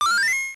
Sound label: throw; SuperMarioWar